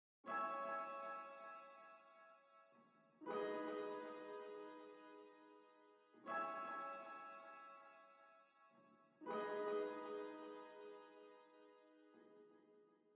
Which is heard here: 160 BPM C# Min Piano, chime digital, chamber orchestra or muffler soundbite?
160 BPM C# Min Piano